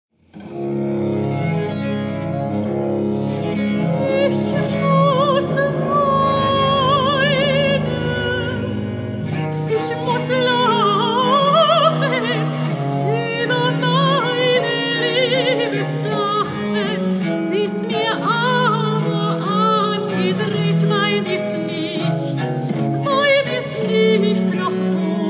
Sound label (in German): Verliebtes Weinen und Lachen